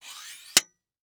Technology Electronic Tripod Light Tripod Extending